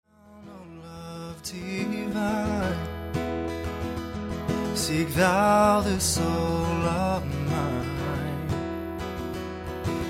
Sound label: male acoustic